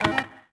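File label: City Button; interface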